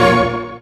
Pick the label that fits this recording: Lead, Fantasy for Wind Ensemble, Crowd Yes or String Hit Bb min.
String Hit Bb min